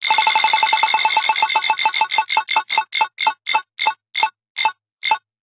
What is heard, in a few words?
luckywheel start